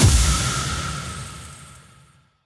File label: big Win Transition; hotline2